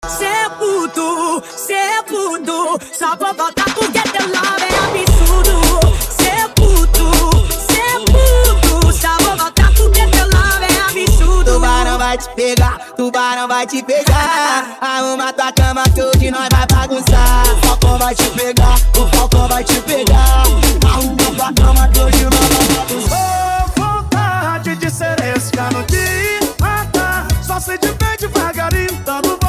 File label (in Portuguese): Arroxa Funk Light